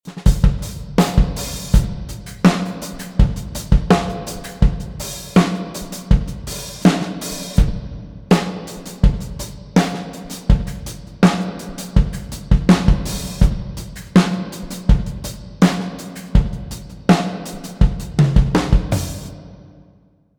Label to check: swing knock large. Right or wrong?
Wrong